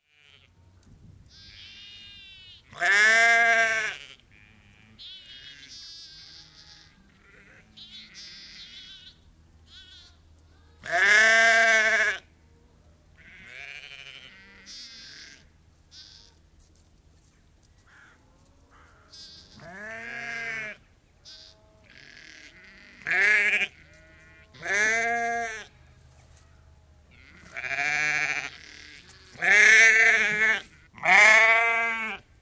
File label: Sheep, 30 to 50 ewes & lambs calling in field, scattered then approach, food bucket shaken, food into troughs, one lamb bleats on own, loud bleats; Cows & birds heard occassionally in background; BBC